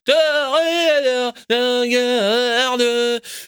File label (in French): corbeau